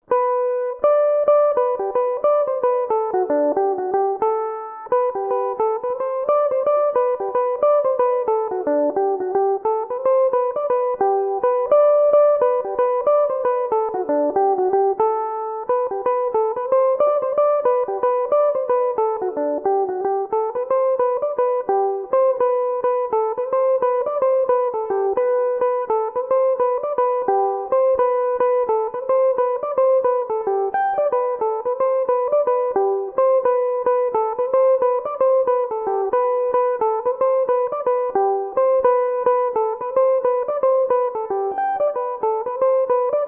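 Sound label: jig g major, mandolin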